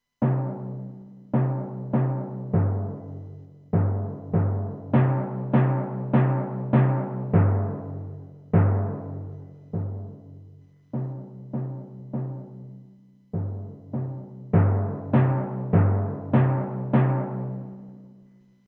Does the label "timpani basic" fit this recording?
Yes